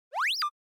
Anime Motion, Slow

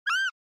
Horror Scream Low Dry

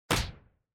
Motion Grab

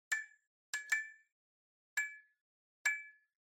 Fluorescent Light Noise Crackle

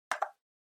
Handheld Transceiver Button

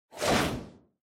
Motion Agility Long; モーション・俊敏

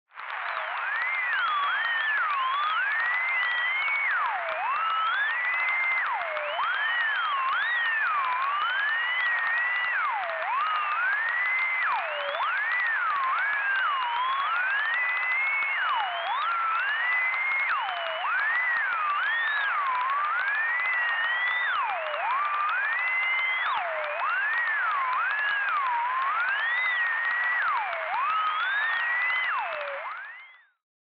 Retro Anime System

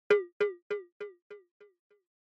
Percussive Accent Delay; 打楽器アクセント02-3(ディレイ)

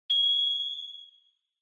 Onoma Flash Mid Short; オノマトペ 光る04-4(中 短); 効果音＞オノマトペ・光る

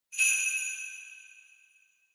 Sleigh Bells Reverb Twist Hard

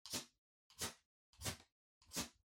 Flint Stick Far Strike

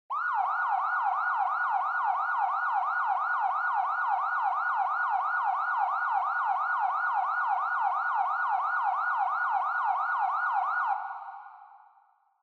Police Car Siren Far

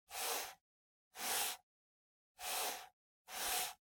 PC Mouse Move Soft Pad Mid

